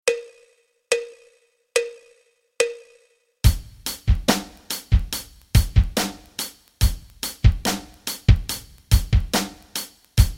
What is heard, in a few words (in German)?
rock rhythmen